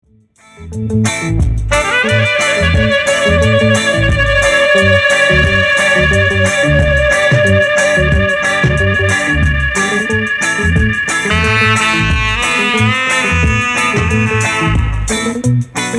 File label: Blowing Up A Storm Dub